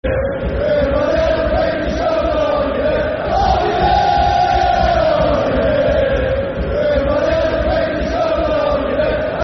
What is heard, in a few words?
Football Chants (Soccer Songs), Cardiff City